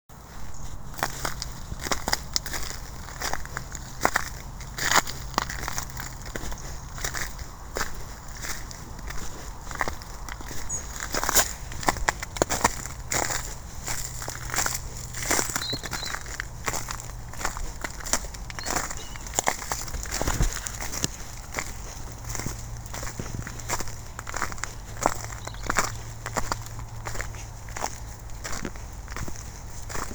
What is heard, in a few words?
crunch